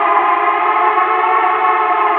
SIRENS; ENVIRN-AMB

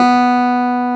CLAV B; Sonic Reality; Vintage Timetraveler; CLAV PIANET

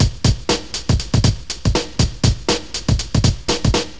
Funky off, breakcore Breaks

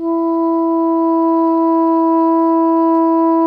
SOPRANO E 3, Saxophones; PP; Greytsounds; Philip Wolfe David Crozier's Saxophones

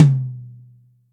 Acoustic Tom, Yamaha DTXpress

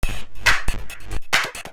percussion loops, 138, Techno

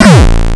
Dirty Square, Kick Drums; Drum Hits; Nu Dance Electro; Zero-G; Soundclash

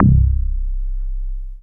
Single Electric Basses, Dance Floor Bass

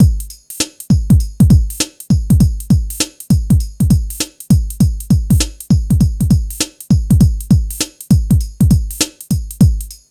HIPHOP; INIS; Funky Xtreams

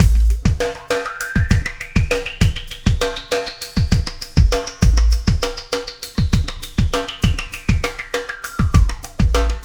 Oscillation Beat

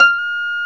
HARP F6; AKAI S-Series CD-ROM Sound Library VOL-8

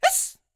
YELP, World Voices, ANDES FX; Spectrasonics Vocal Planet

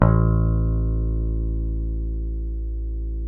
A1, Bass; Sampleheads; Will Lee Bass Library

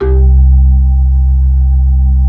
B-3, Blues, Keys of the 60s and 70s

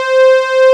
SYNPAD DN C4; CMP - Analogon

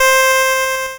C5, LSDJ Notes; LIMAT LSDJ kit

